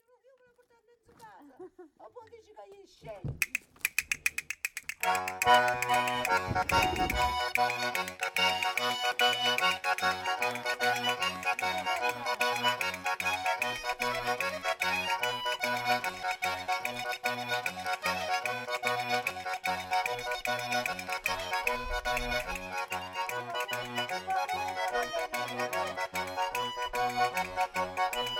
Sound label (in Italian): saltarello gnaccule e organetto